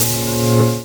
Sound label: Chords Tones; Equipped Music; Loungin House